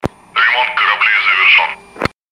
repair complete

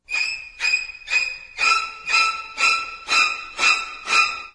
psycho violin

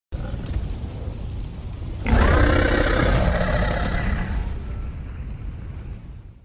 roar small; alatreon

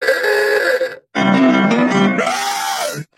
goofy sounds

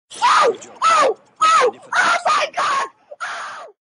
oh oh

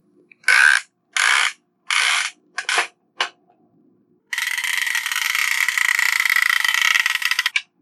8mm movie camera wind up shutter timer

